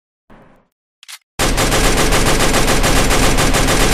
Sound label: Gun, Free Fire; بازی فری فایر